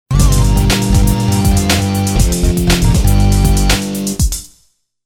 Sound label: Cool Trans; Soundzabound